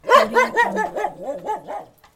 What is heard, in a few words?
dog barking; gsm